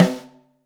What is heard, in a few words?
Top, Snare; Ableton Foundation FreePacks; Snare Designer